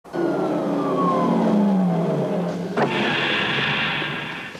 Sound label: elevator door